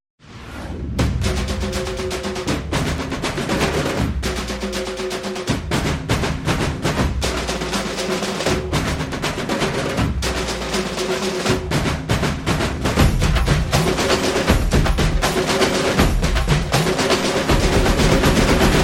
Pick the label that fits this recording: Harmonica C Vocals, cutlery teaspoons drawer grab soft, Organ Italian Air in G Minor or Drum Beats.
Drum Beats